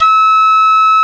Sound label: Flute F4